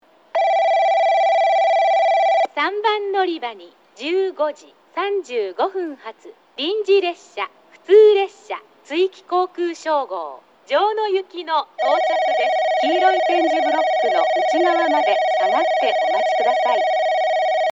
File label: Tsuiki Yukuhashi local Jyono; 行橋駅 接近放送（城野行き）